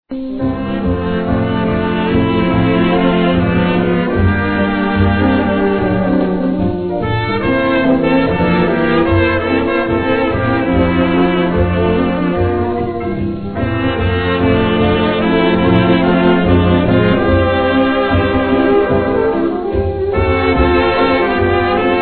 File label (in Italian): Orchestra da ballo, slow